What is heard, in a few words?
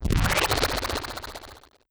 strengthen